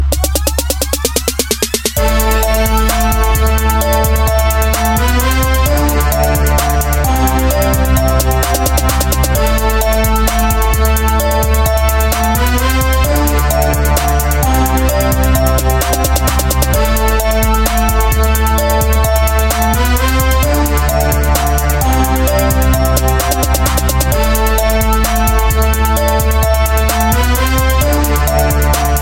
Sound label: Hip Hop